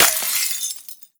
break glass phys small; physics; breaking

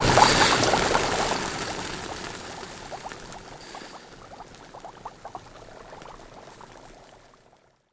headcrab boiling; scripted sequences; hideout

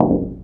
glass sheet step; physics

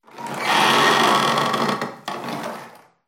ambient sanctuary wood; alyx sanctuary wood

